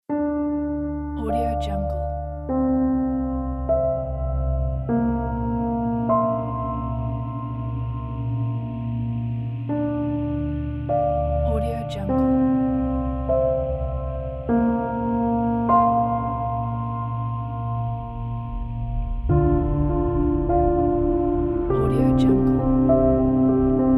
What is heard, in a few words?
Melancholic Depressive Piano